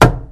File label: wood box impact hard; physics